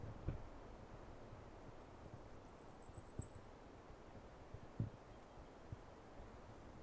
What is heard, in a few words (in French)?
paruline rayee